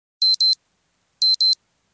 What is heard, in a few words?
wakeup; STALKER; IWP